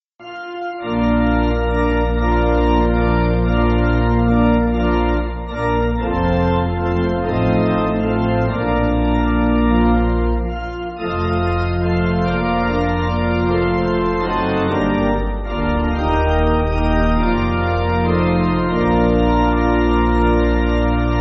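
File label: Pipe Organ, church backing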